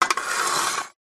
food drink can soda empty scrape wood floor

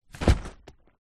foley tent in bag drop carpet; zapsplat

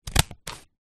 office scissors cut paper close up; zapsplat

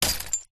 impacts thud light into loose small metal pieces

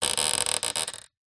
household door creak

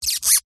cartoon squeak

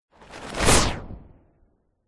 superhero fly by fast whoosh cape; zapsplat

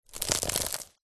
basket movement stress; foley; zapsplat

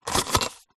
household aftershave bottle remove from card box

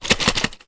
household medicine tablets pack foil box shake; zapsplat

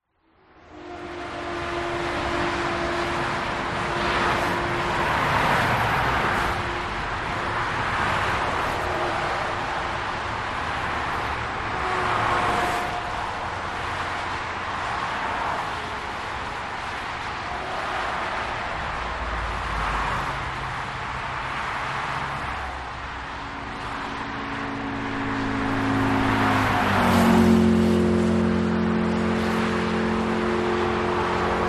traffic Germany autobahn busy; a8

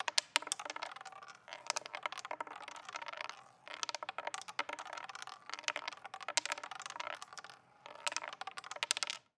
Roulette Wheel; Blastwave FX

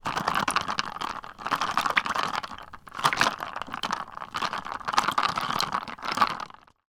food drink liquid ice cup shake; macdonalds

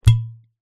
bottle glass empty finger pull from top; zapsplat

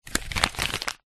paper sheet fold; foley

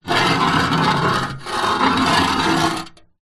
foley metal rod drag scrape on rusty metal table; zapsplat

